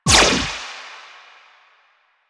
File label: Choir Erupt Firing Eye; Warframe